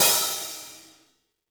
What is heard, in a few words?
hihat - opened; JazzFunkKit